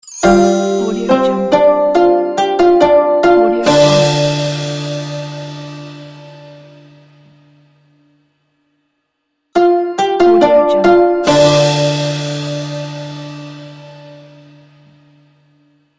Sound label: chinese new year logo